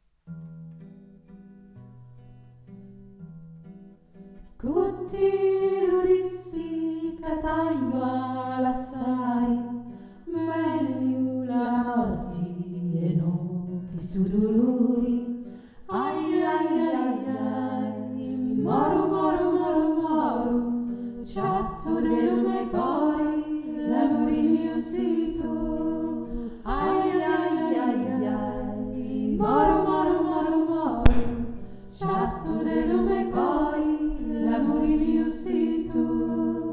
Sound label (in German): cu ti lu dissi zweitimmig